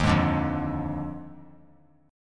Eb Major 7th Suspended 2nd Suspended 4th Eb 3C 3F 3A 3D; strummed; EbM7sus2sus4